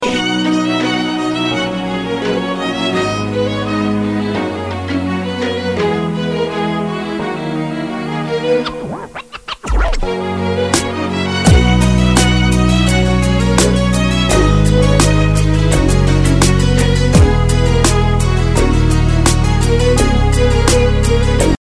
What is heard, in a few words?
Canon In D Major